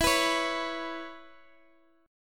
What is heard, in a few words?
E 5th 6th; E56 Chord {X X X 9 12 9} strummed